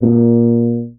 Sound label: Contrabass Tuba Straight A#1; DirectWave; Varazdin Orchestral